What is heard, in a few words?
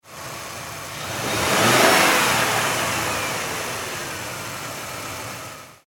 Car Mercury Sable Medium Rev; Power Car; Car 2002 Mercury Sable Medium Rev; Car Sound Effects